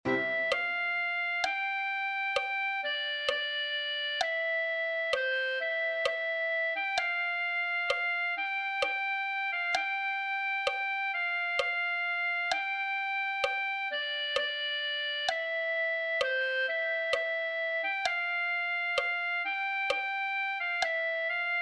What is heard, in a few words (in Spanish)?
clarinete